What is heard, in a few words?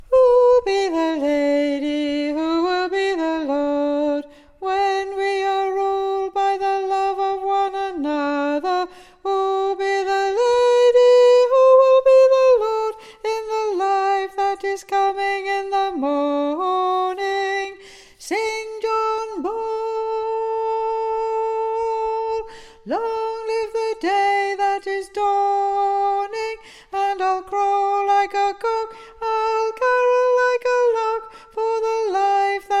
Bass